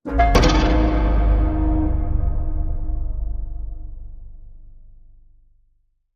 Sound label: Broken Piano Boom